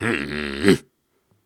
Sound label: Snarls and Hums, Viking Vocal